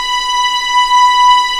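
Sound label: B5A legato, violins; East West; The Ultimate String Collection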